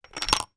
household torch flashlight maglite battery x1 insert; zapsplat